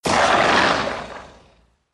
zombie infection male

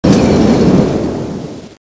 shoot end, weapons; airburster

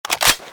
boltpull; skull3; weapons

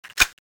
clipin, weapons

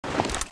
as50 draw

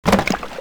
water clip out, weapons

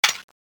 clip out, weapons; thanatos1

